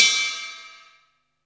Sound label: T RIDE CUP; Back In Time Records; Korg Universe VOL-1; DRUM FAMILY